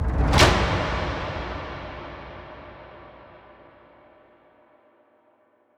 Impact; Hits

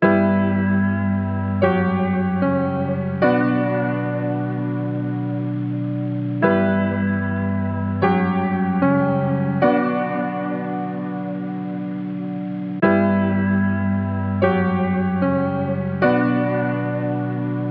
150 BPM B Min Piano, melody loop stem; Loop Stems; Cymatics; 2022 Melody Collection; Colossus

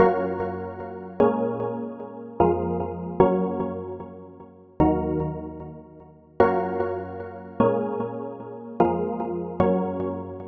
Chill Loop - 150 BPM A# Min, synths; Chord Loops; Future Bass; Heater

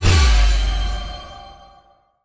Hero Death FX - GMaj7 Sus2; Video Game; Cymatics; Video Game Sample Pack Vol 1

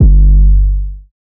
808 - G, Hip Hop; Drums; One Shots; Humble Hip Hop Sample Pack

